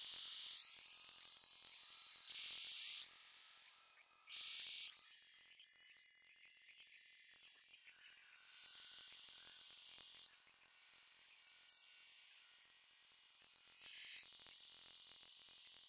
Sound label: Image 2 sound